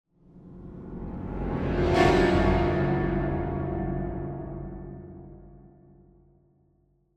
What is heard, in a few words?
Piano Reversed Danger Chord - Suspense; Hollywood Edge; sound designer's toolkit v2